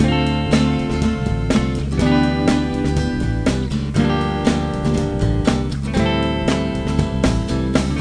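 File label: Loop, synth fx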